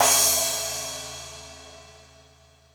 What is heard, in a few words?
More Cymbals, Drum Machines E-MU Pro-Cussion